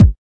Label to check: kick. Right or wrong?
Right